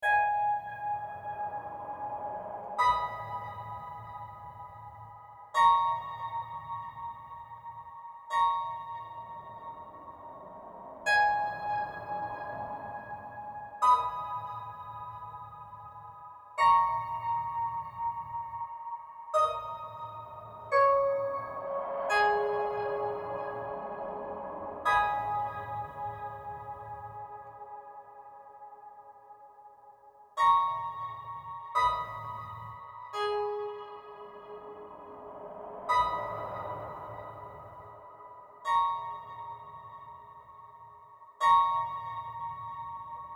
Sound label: pipa plucked